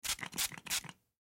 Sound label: Cleaning Product, Cleaning Product Spray Pull Handle; Household; Blastwave